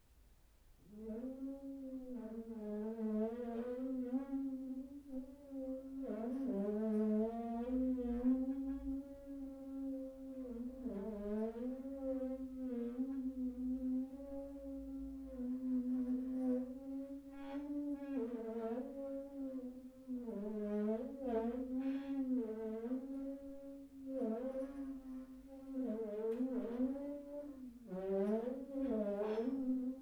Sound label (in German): Rubber Mallet klein Tischplatte Bierzeltgarnitur